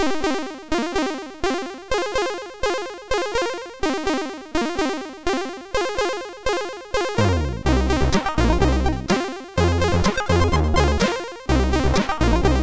Stoned Rock, MOS6581R2

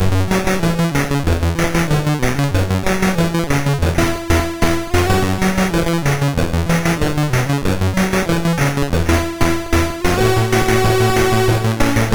Synth Sample, MOS6581R3

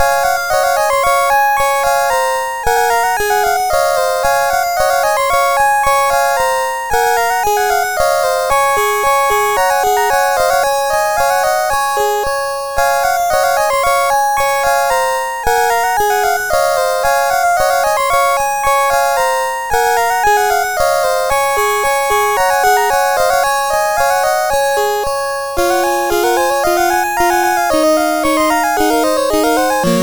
Keyboard Piece, CSG8580R5

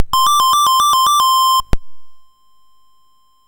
Dragonworld sid MOS6581R4

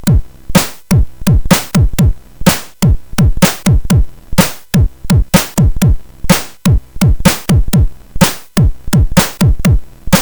Live, CSG8580R5

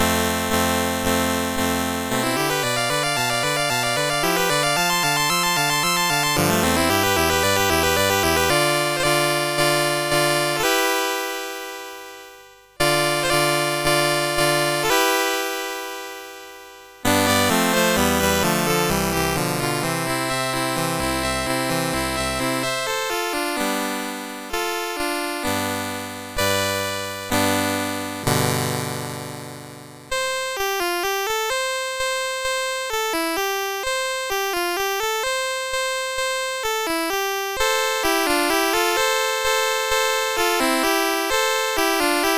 Aria in F Flat Minor, MOS6581R3

